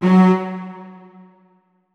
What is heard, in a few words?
notes, keyboard strings; Hideout Furniture